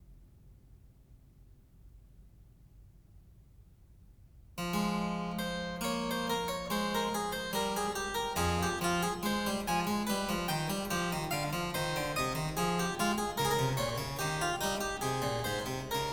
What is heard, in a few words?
Clavier Fugue in F Sharp Minor